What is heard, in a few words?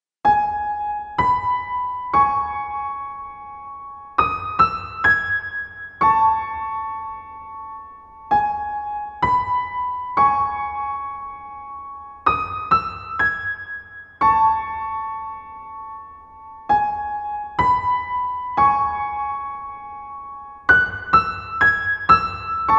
Piano